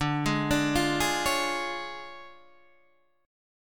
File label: D Minor Major 7th Flat 5th, D, Guitar Standard; 10,11,12,10,9,9; DmM7b5 arpeggiated; JGuitar